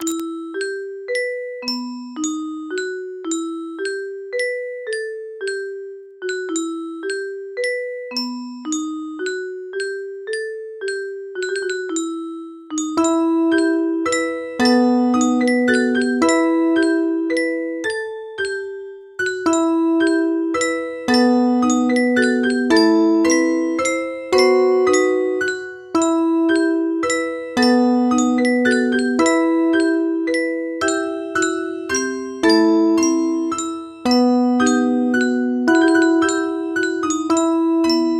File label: Music Box